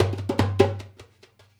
Dumbek; Essential Percussion

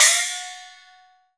CHINESE PRC, PERCUSSION; AKAI S6000 CD-ROM - Volume 1

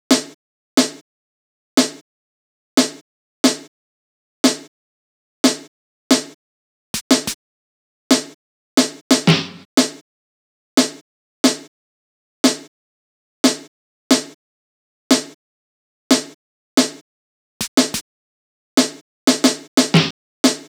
Snare, Snare Patterns; Beat Components

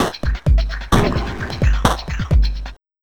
Bumpers; Sonic Foundry (Sony Creative Software); Esoterik Beatz; Messup Fx

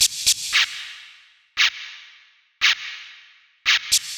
115 Perc Shaker; Zero-G; Future Wave

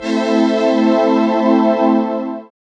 SYN SURFI, dance synth; Sound & Vision - Gigapack I CD 2

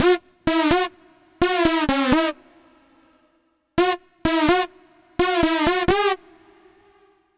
Android Riff, Funk; Dr. Fink's Funk Factory

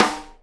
SNARE RIM, Live Snares

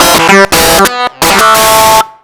DISTLOOP, Big Beat Samples

